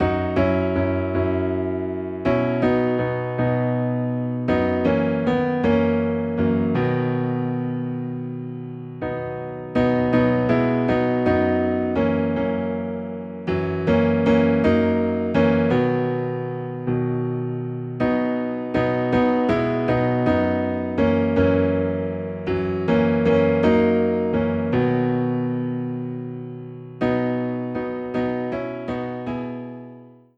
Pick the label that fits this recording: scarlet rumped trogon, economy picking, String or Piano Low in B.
Piano Low in B